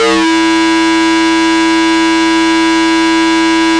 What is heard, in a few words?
ooowwwww, Synth